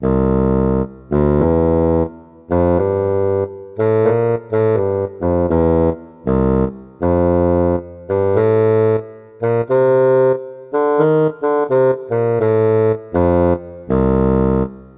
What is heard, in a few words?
Sneaky Cat, funny quirky